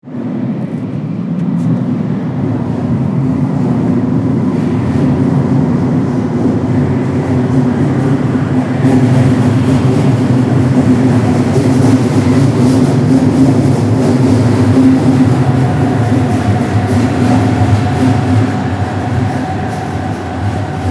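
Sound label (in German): Bahn S-Bahn fährt ein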